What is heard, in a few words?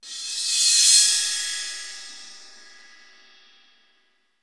CYM; STANDARD PRC